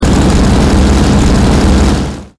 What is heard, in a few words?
P40 engine